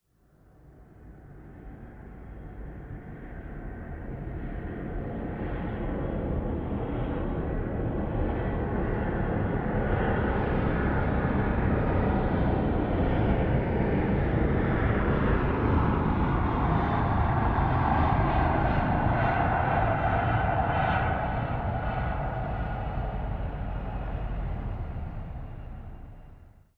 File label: Plane Passbys window; Planes; Fox Audio Post-Production; Planes Passbys – Interior-Exterior